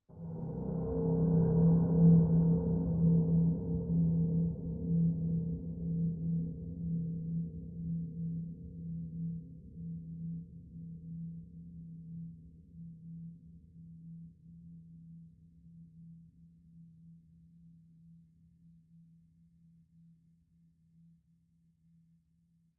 eerie note howl metallic bowed reverb eerie single; mkh60; microbes